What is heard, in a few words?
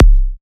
Kick C, psy kick; Drums; Ghosthack; Ghosthack Advent Calendar 2017 Day 9 - Psy Kicks; Ghosthack 20 Free Psy Kick